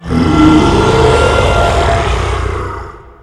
dragon

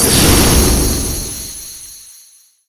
flame

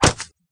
arrow

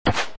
bow

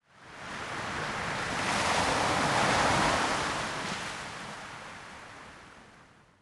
shoal

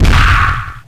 punch

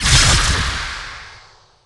dispel breath

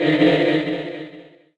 debuff

